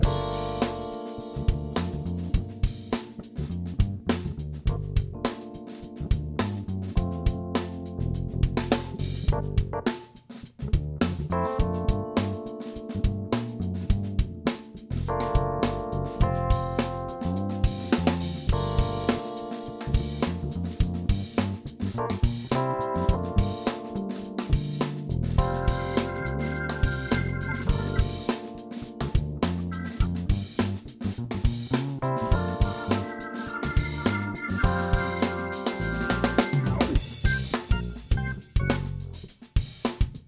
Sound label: solo loop